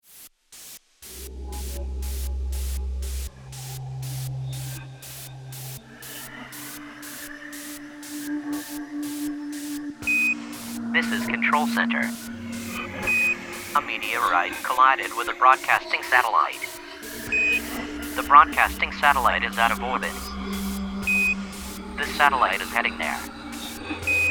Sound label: CRASH